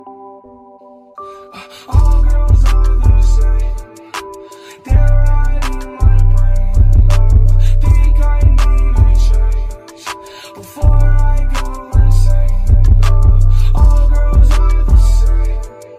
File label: bass boosted